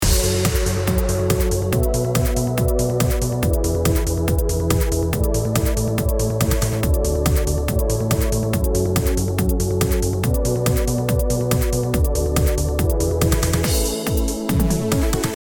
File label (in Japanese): シンセバッキングなし